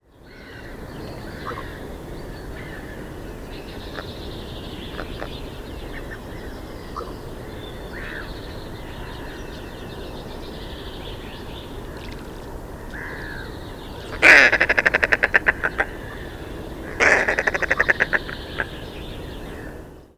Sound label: Typical mergansers, مرگوس